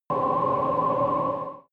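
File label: extrinsic wind, energy; energie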